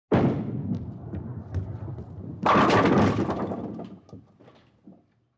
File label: Throw Bowling Ball Ro